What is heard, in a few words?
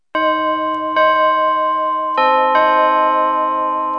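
church bell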